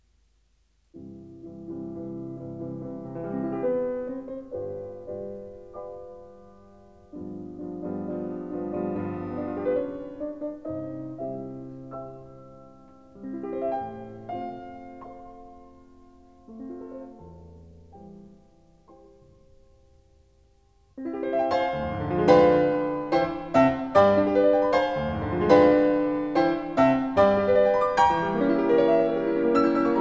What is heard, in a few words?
classical